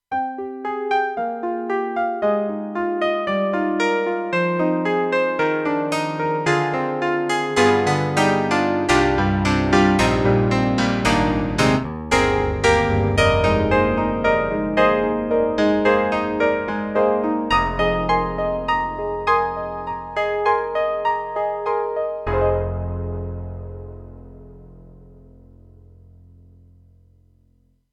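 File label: Electric Grand; Roland JV-2080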